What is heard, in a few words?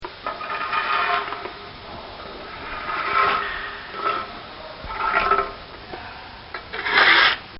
Shoveling Feed Inside A Barn; Building Sound Effects